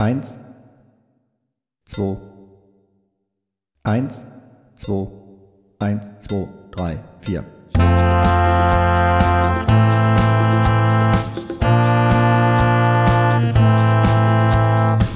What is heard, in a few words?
Tutti